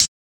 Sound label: Closed Hats